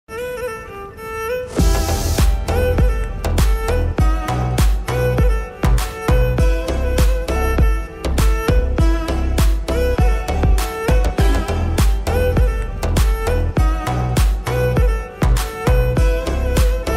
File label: violin version; leo movie bgms; leo climax bgm; leo ost